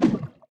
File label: basic Attack cast; battleBrewmasters